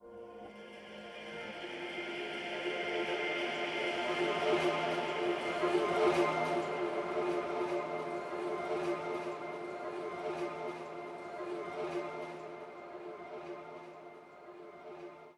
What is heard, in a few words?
BGM Lobby; Metal Mind